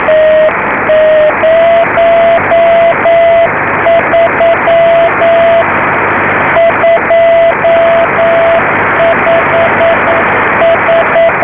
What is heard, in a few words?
tlm ao7